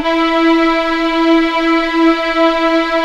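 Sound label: E4 legato piano, violins; East West; The Ultimate String Collection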